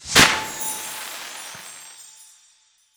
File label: explosion small win; netent